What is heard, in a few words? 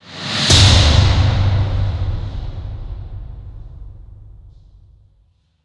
Tank Whoosh Hit